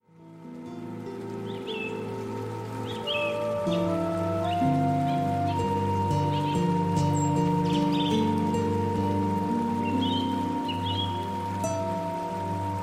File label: Sleep Music